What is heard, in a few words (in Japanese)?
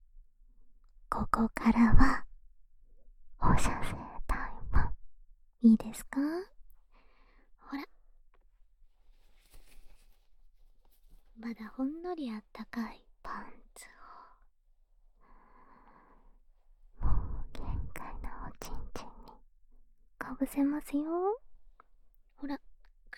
両耳舐め パンツコキ, ASMR